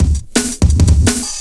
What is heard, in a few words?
Steppa Cut, Drum Loops 169.5; Zero-G Jungle Warfare 1, 2 & 3